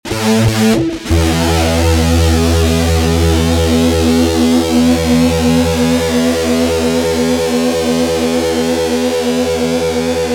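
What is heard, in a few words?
fuzz guitar mistress reissue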